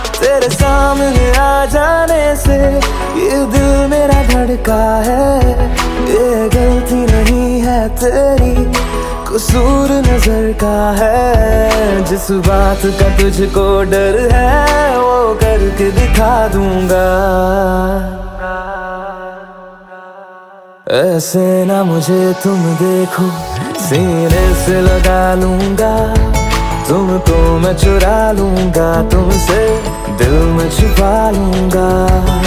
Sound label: Love Song Ringtone